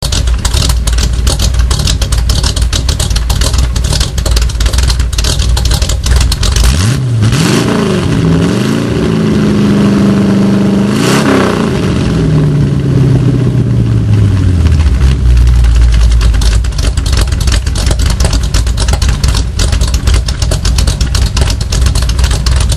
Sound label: 280z sound